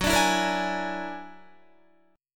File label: Gb Augmented 9th Gb down Guitar 4E,4A,5D,5G,5C,6F; Gb+9 strummed